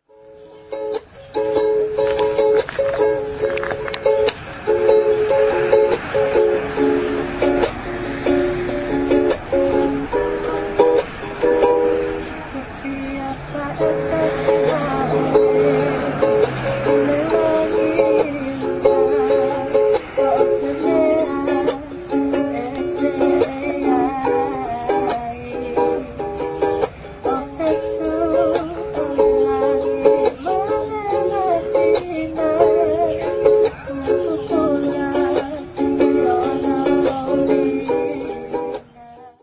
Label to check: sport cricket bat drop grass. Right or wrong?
Wrong